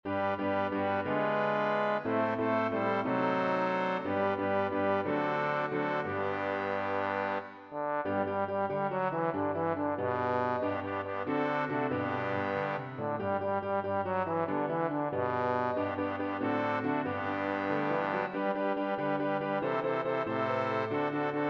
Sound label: Bass